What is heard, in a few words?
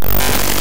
Glitch